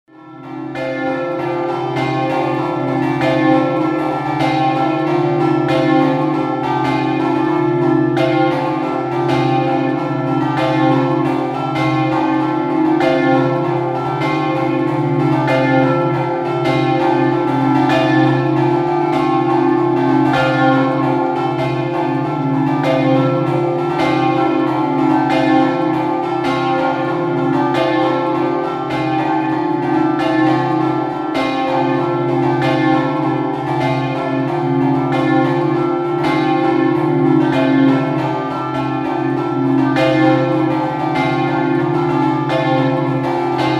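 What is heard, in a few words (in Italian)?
Pasian Prato scampanottata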